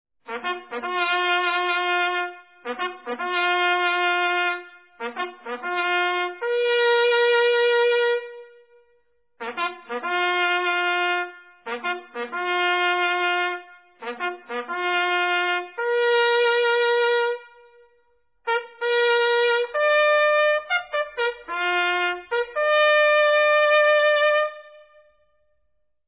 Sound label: Bugle Call Reveille Rouse